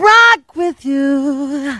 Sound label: spy positive vocalization, voice packs; spy jackson